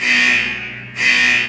alarm; Ueberschall; Techno Trance Essentials

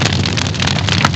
CRACKLE; CMI Sound Library Rev 1.3

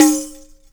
dum, pitch perc; East West - Global Sounds

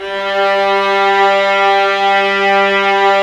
STR VAS, Amb f; Roland L-CD702; Vlas Sect3-4

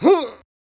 Beatbox; neuro; Sinistarr

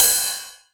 909 Ride, Drum Machines; DrumstationV1

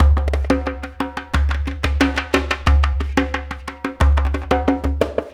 Tactile Impulse, Processed Drumkits

